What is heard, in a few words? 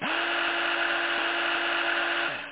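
gear down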